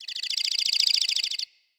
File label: Forest Open Glade Bird Close; Day